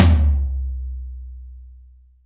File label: tom, Percussion; realdrum